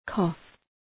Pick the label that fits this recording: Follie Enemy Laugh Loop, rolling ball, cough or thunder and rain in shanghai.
cough